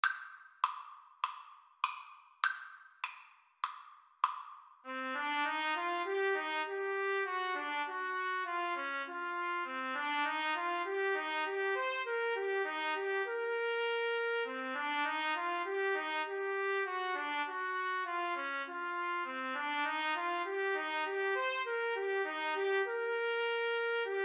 mountain king, Trumpet Saxophone Duet; greig

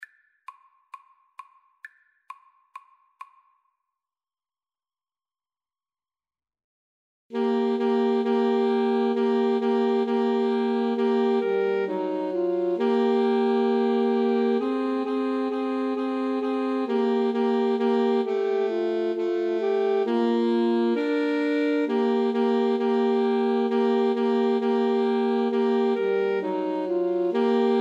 jingle bells boogie, 2 Altos Tenor Sax

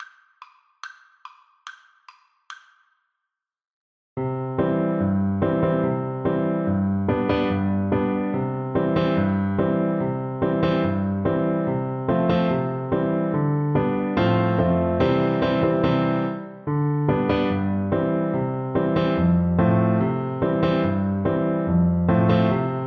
Piano Duet

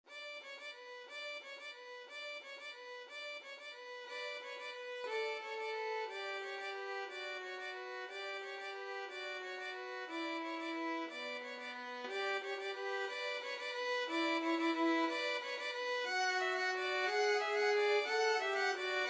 carol of the bells violin duet

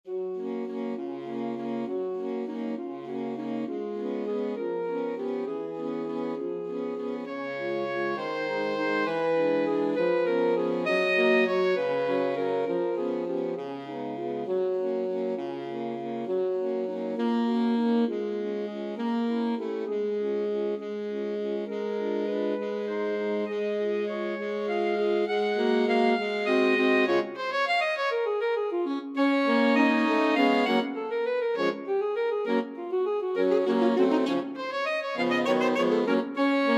tchaikovsky waltz, alto sax quartet

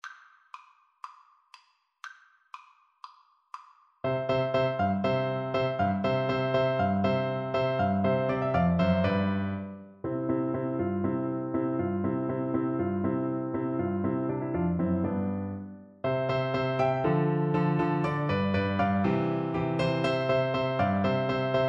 horn, French Horn

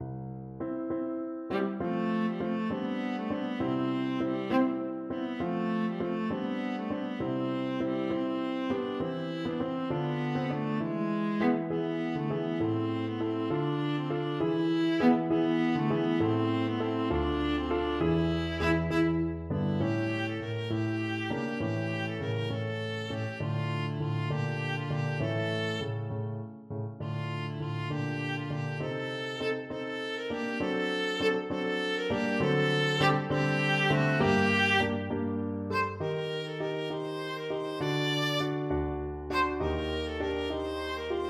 viola

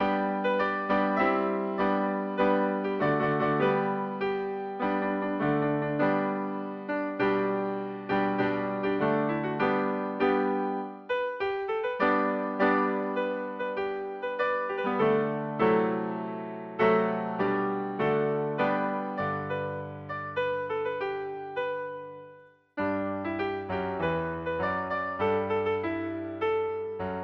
anthem, piano; chinese

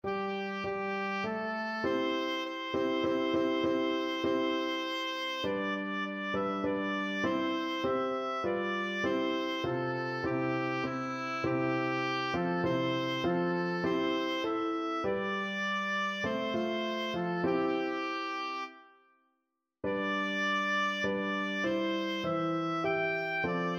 to the river, oboe

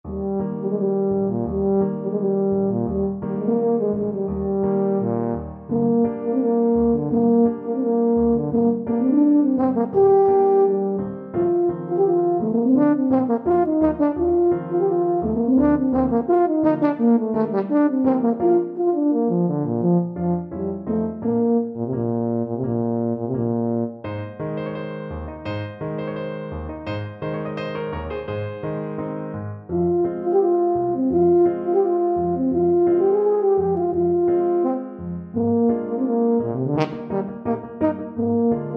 tenor horn

